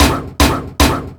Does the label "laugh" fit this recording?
Yes